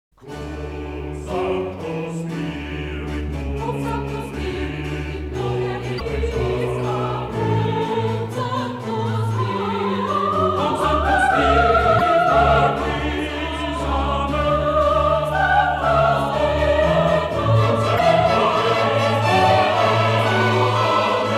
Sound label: Orchestra Vocal Ensemble